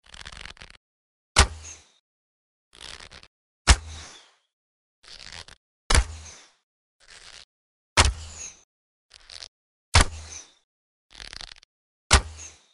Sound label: bow sounds